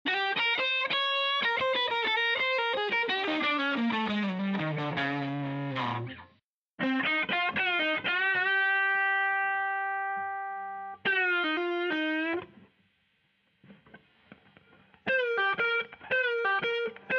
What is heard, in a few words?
solo